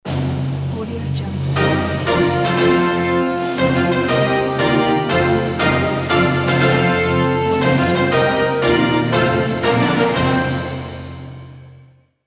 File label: fanfare